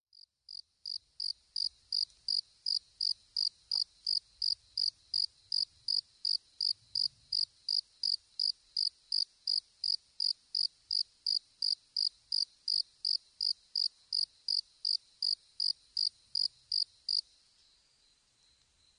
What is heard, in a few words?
Velarifictorus micado; ツヅレサセコオロギ; 日光市稲荷川中流 alt=730m; Rec.: EDIROL R-09; Mic.: built-in Mic.